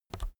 keyboard external key release soft

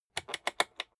rotary control turn hard

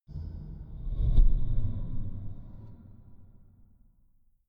ringing impacts

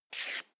marker line medium

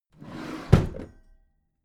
packed drawer smooth close heavy

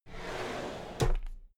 drawer cabinet equipment open regular

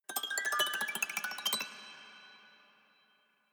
haunted strings weird stroke tinkle soft

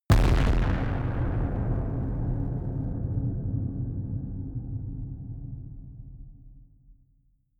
distorted explosions stereo

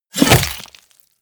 flesh axe two-handed hit

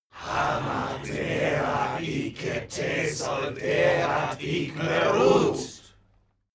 male crowd evoke

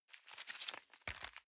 envelope swap squeak

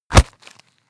punch low smack hit

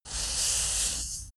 paper cloth dragging

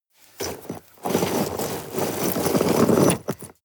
sweep rocks

